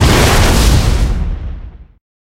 hit; rudy

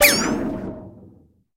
death

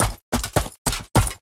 labyrinth brown walking

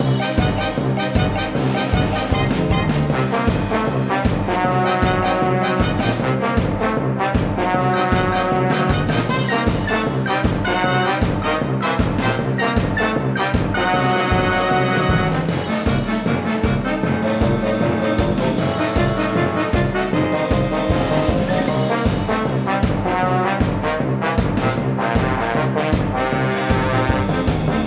bgm battle

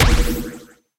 click

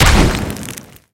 click

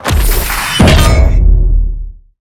guild battle gimmick silence

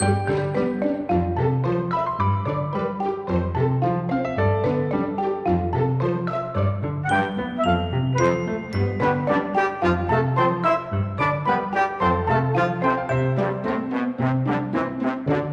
bgm loading

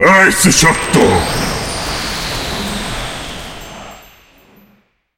skill ice enemy

